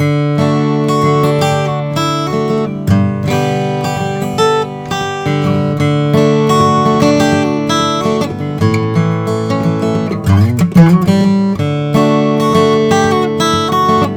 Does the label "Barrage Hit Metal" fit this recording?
No